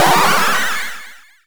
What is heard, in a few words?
magic